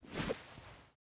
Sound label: bow deploy; Rust